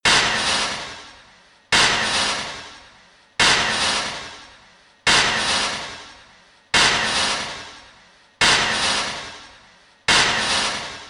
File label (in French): Boom